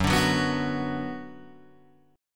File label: Gb Suspended 4th Sharp 5th Gb, down, Guitar, Open G